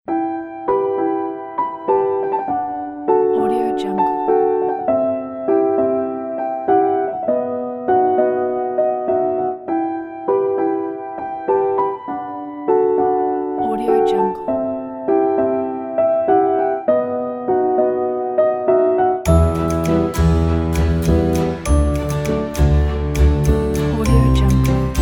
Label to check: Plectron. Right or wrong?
Wrong